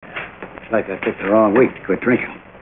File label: drinking